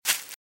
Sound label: Velociraptor - Footstep - Grass - Run - Right; RadwinIsland